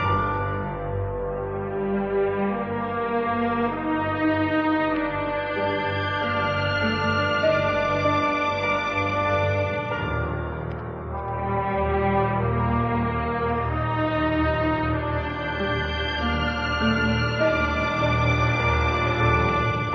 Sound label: strings, Sad Loops